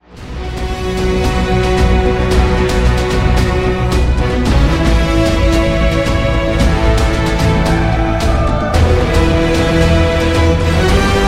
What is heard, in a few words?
Anthem Theme Song